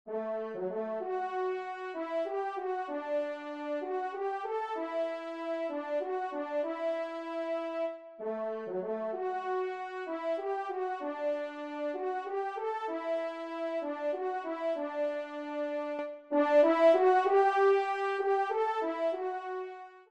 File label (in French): trompe; Chapelle Saint-Martin – Moulins-le-Carbonnel (72); 1e Trompe